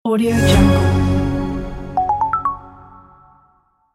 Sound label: marketing logo